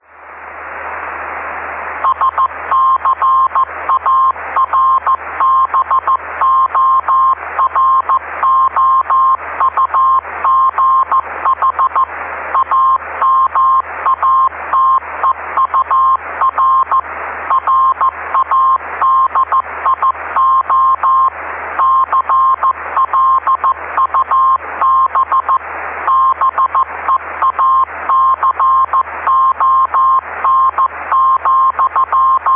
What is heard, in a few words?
cw beacon